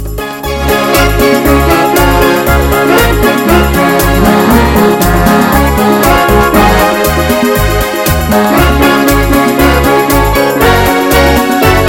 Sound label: Slow and Happy